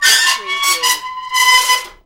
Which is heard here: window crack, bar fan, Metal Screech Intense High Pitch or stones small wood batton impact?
Metal Screech Intense High Pitch